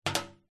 foley money coin set down gently on metal; zapsplat

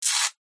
industrial spark electricity surge

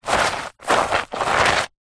foley footstep sneaker scuff movement dirt

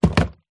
present wrapped box fall over; zapsplat

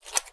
multimedia cut snip; dustyroom

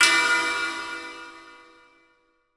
impact metal large reverb resonate clang

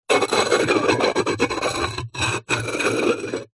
household pot ceramic base lid plate scrape

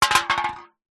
drink soda can empty drop knock over wood deck; zapsplat

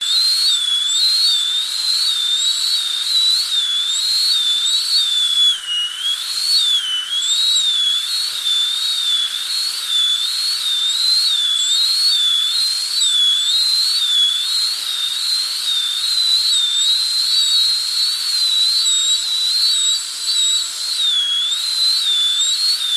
windy suction whistle loop; the sound pack tree

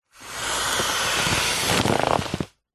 plastic bag thick reusable blow up inflate; foley

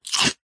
human eat crunch bite single dry cereal piece

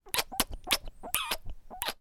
human kisses soft many

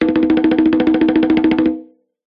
cartoon running take off bongos classic; zapsplat

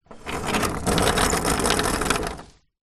household gate driveway wooden roll open or close; zapsplat

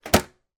office file box metal close

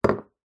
cup mug empty set down wood table; household

